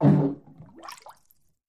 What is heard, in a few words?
Bathtub Fart Unexp